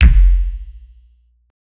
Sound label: punchy electric bass drum